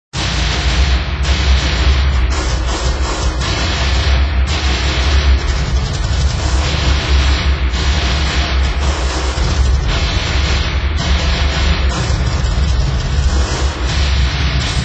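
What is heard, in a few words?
Music Ambient; Saints Row 2; PS3; Xbox 360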